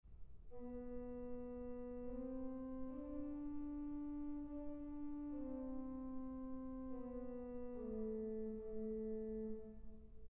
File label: Jesu hymn tune